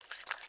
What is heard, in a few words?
Clown Cake Wipeout; Kindergarten Online